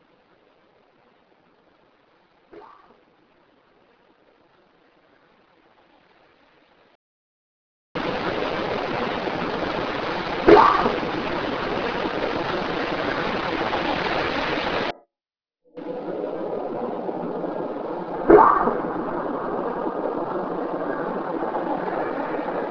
Woaaow; What sounds like a cough; IMPS (Inter-Mountain Paranormal Society; Bisbee/Tombstone

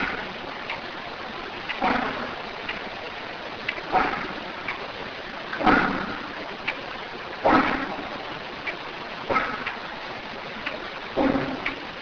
mechanical; Strange bangs and scrapes were recorded in Room 36 in the middle of the night; The Jerome Ghost Report

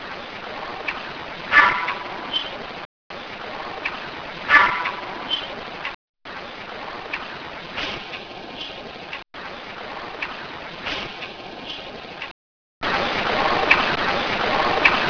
loud scrape; this weird noise sounds almost mechanical; Recorded in the same location as the previous EVPs

